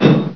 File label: ladder, player; hl2